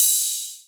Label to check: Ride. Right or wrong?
Right